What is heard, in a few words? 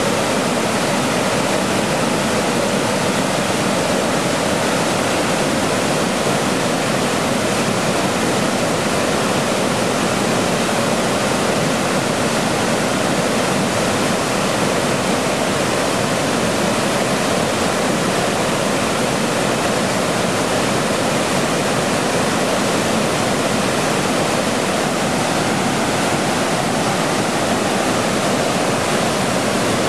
jungle waterfall; gesource